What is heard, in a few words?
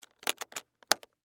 Foley, Case, Latch, Close; Latches; Objects